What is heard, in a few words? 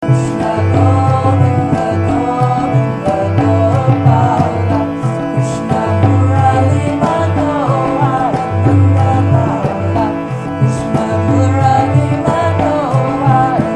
Krishna Govinda Govinda Gopala